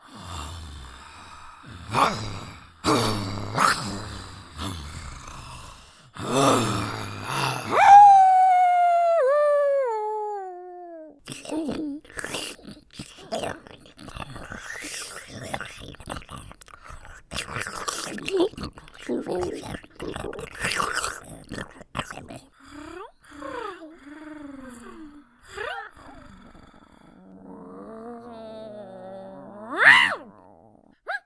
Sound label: Creature